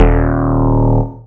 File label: Bass D#, techno synth shot; Bass One-Shots; Ghosthack; Techno Synth Shots 2025